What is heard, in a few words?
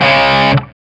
D 4, Soldano rock guitar; Spectrasonics; Hans Zimmer Guitars VOL-1